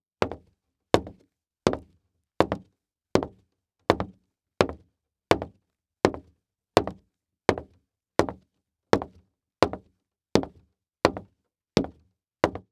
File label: Foley Footstep Cowboy Boots Walk On Hollow Wood; Foley Footsteps